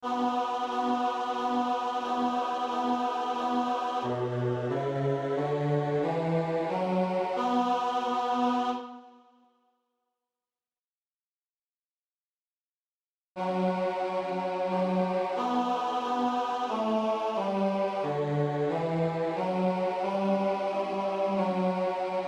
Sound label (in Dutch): bas; Motetten; Ave Regina Caelorum